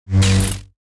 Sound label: lightsaber clash